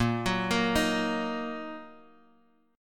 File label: Bb Suspended 4th Sharp 5th down, Bbsus4#5 chord; Bbsus4#5 arpeggiated; JGuitar